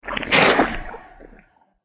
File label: death; shupaljtse